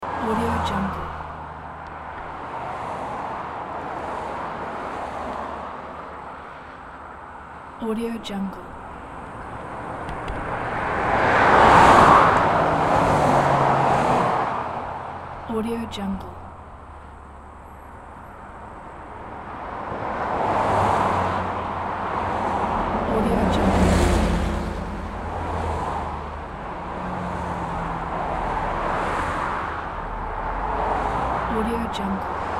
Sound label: highway; taraster